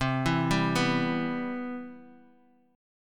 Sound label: C Major 7th, Guitar Open D